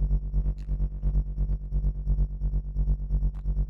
Rythmic Noise 130BPM

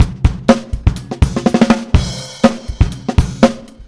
ACOUSTIC, Big Beat Drumloops; USB Soundscan vol.25; AKAI

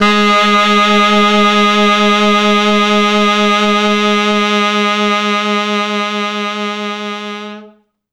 T Sax VBFF, Quantum Leap Horns Sax

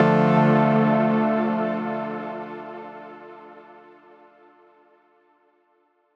Dm; Daydreamz; Chords; M8; Electronisounds

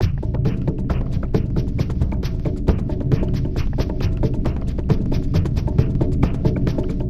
SWAMP GROOVE; Sonic Foundry (Sony Creative Software); Vir Unis Infusion

